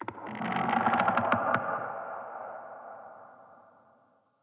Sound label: Trees Talk; Ambience; Corrupted Ode Two